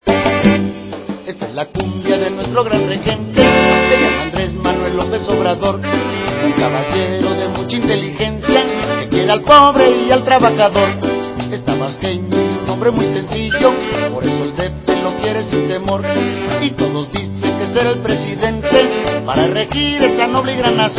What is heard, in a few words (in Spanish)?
Cumbia